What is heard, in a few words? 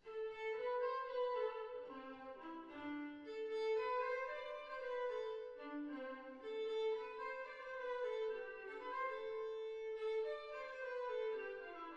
Tavern; Mount & Blade: Warband; Android